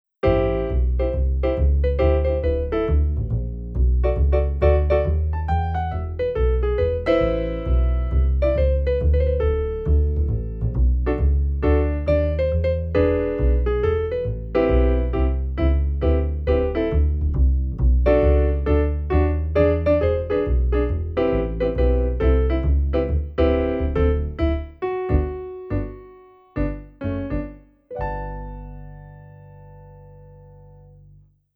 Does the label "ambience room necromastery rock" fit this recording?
No